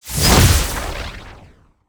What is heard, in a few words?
BOSS Fabao Skill